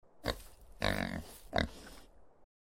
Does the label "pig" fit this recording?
Yes